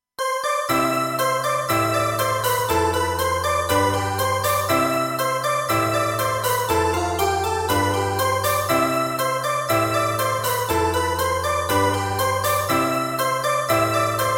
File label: major melo